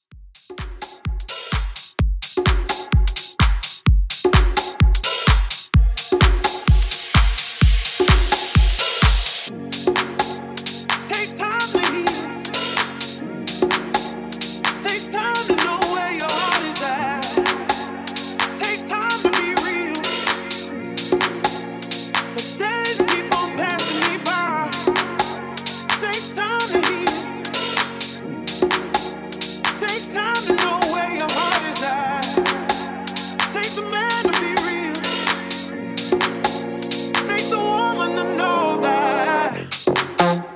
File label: Future House 128Bpm